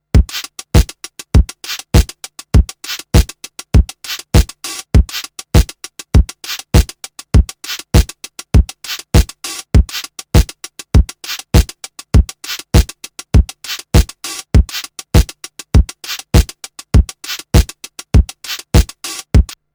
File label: Drum Machine Lo Safe